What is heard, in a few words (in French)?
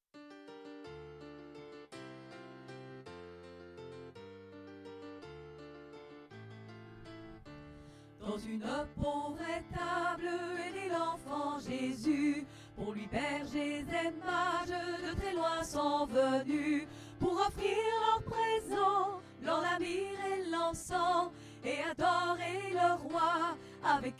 Noel joie dans le ciel Tutti